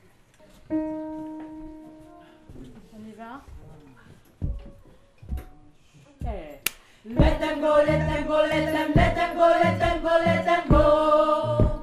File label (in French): partie alto, gospel